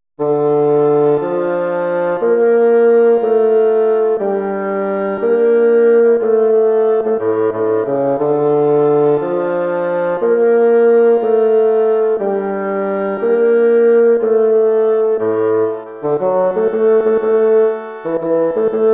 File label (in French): basse part 2